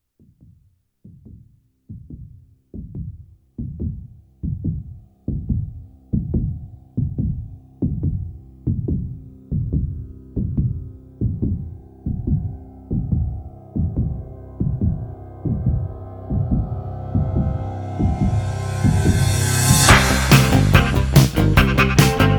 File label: heartbeat sfx classic electric harmonica classic rock club